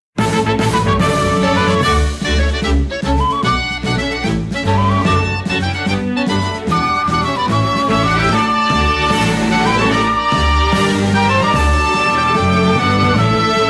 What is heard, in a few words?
Victory!; Android